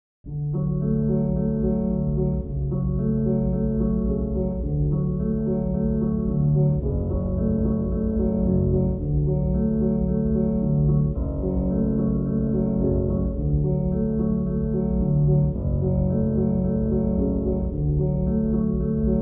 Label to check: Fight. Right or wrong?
Wrong